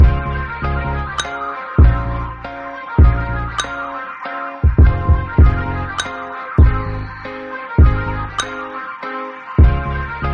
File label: instrumental bgm